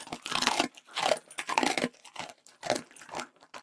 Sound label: eating; minecraft; technic